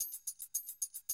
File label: drum loop, 100 BPM